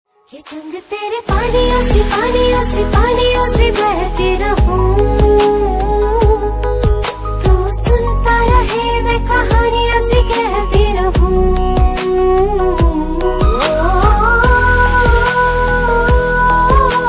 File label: Female Version Ringtone